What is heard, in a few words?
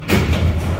door Open, Metro